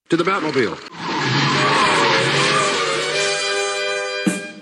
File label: Opening theme song motif; Batman